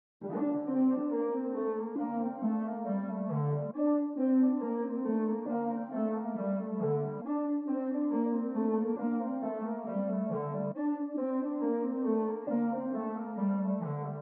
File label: 137 BPM D Min Synth; Melody Loops; Synth Collection; Cymatics; ANALOG EVOLUTION Beta